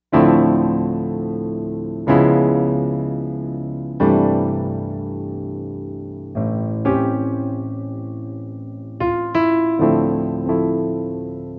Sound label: Piano2 16', Korg M1Rex; Factory Patches